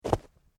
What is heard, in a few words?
Footsteps Snow Single; Human